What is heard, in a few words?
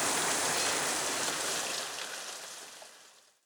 Props Fire Hydrant Water Spilling Out